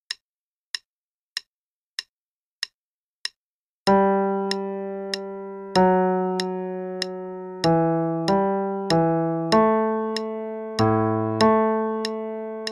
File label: Minuet in G Major (LH only, qn=96); Bach